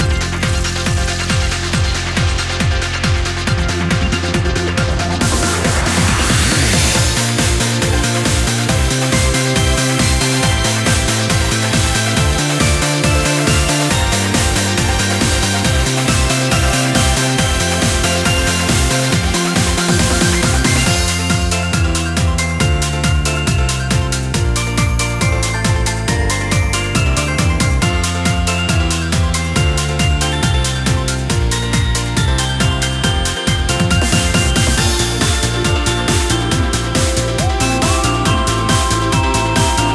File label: BGM Main Menu; SNK Heroines Tag Team Frenzy